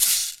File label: Shake; Mo' Phatt; Digital Sound Factory